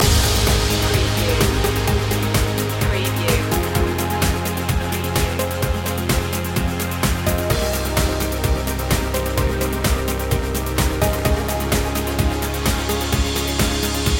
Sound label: pass me by loop